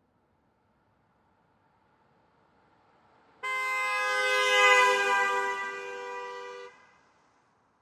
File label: Pontiac G6 2007 Drive By Right To Left 35 Mph Horn; Cars